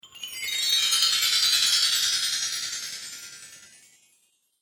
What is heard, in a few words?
Christmas Spell, Magic Sound Effects